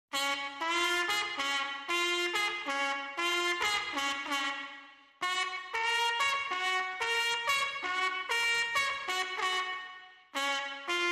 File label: Trumpet